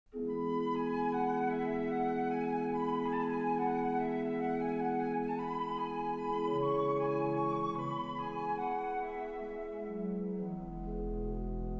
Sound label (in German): Querfloete - Morgenstimmung